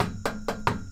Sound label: perc